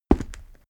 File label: step stone walk